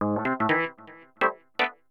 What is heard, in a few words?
SYNTH HOUSE 125 A; eJay; Keys